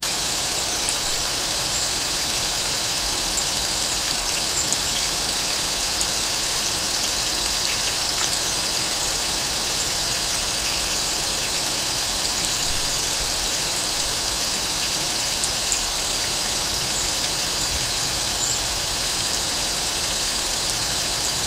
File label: a calming mix of rainfore; animal calls